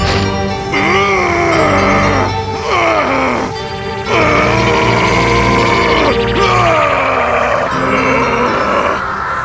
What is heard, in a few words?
powerup; db mod; piccolo